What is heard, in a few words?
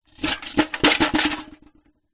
household bucket metal old rusty set down concrete; zapsplat